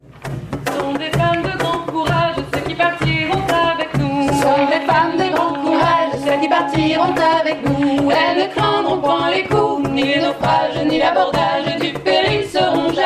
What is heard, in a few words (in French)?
le chant des corsairEs